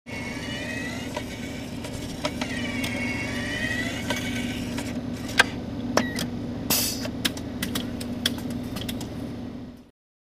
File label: Blood Analyzer; Machine On, Run With Clicks, hospital